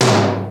KINGTOM LO

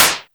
NOISE CLAP; drum; CLAPS+SNIPS

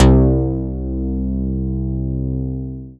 BASS MONO

